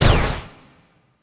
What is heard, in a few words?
Bow Hit; smite; god cupid skin13